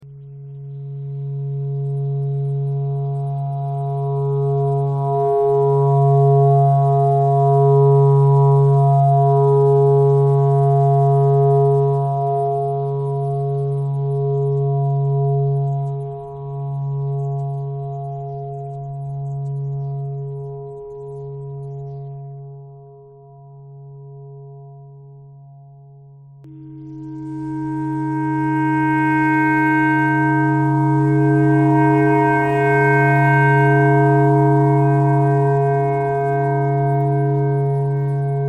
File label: clarinet clarinet pentatonic